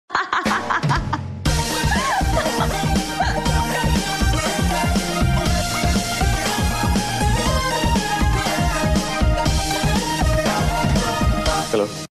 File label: Funny Tune; Zoyas; Tv Serials; Zee TV; Qubool Hai